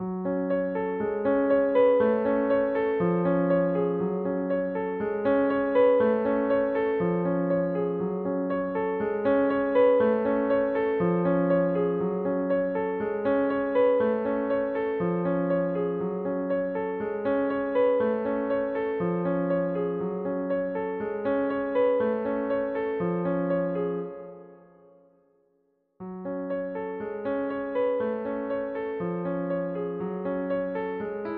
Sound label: Turkish March, Piano